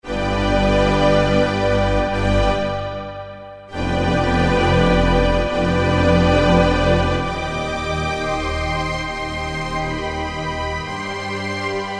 ST Widestg, Yamaha TG77; Factory Presets

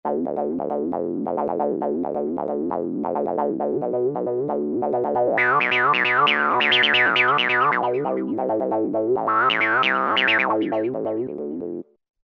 Roland V-Synth; Pocket TB; Factory Patches

